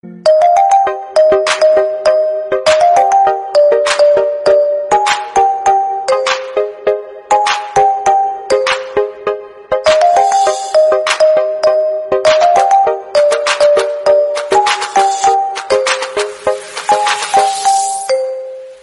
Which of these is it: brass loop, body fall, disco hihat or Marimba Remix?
Marimba Remix